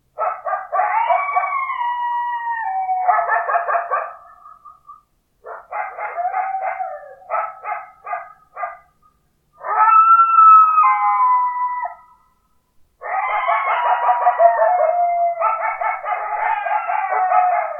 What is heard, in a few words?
Coyotes